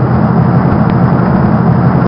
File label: jeep drive